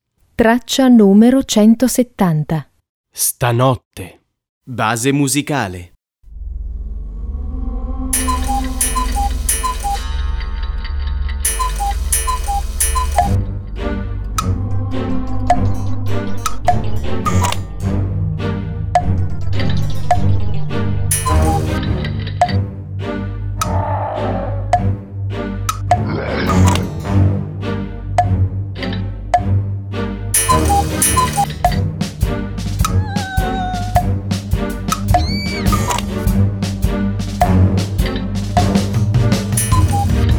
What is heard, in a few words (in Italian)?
base musicale; scuola al centro; infanzia